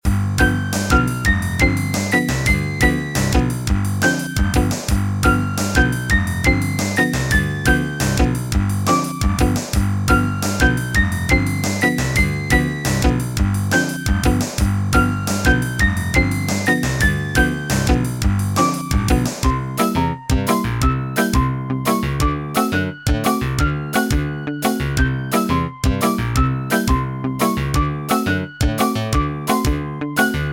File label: Leveling Up Music; Gun Skaters